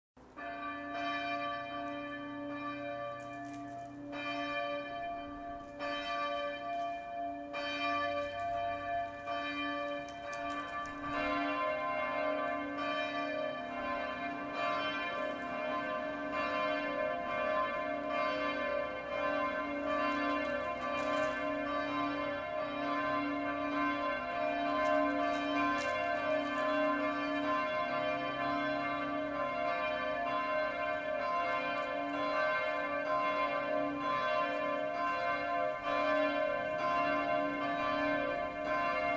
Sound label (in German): Tonaufnahme c2 d2; mehrstimmige gebrauchte Geläute